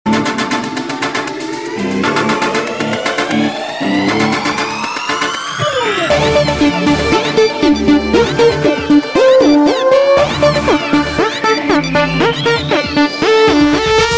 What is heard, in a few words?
ringer